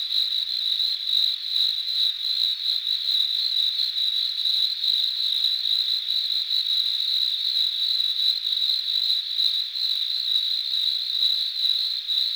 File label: crickets heavy; WAR